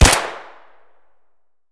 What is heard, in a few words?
p226 fire close; Escape from Tarkov